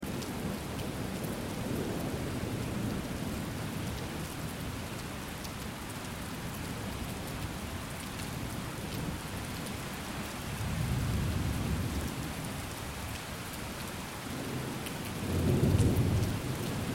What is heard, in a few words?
rain and thunder